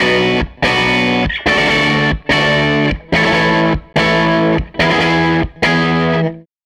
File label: SG Guitar Loop